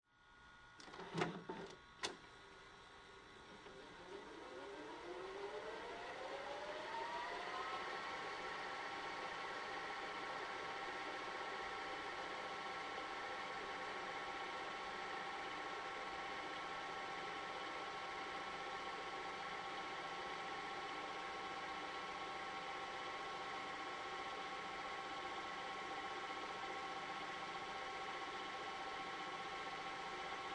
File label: Technology, VCR, VHS Tape Rewind; Entertainment System; Entertainment Systems